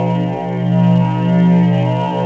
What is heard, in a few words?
absorb loop, force